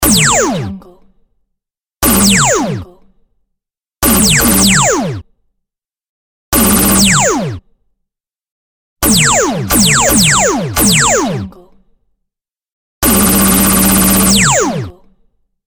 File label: laser shot; muratovsky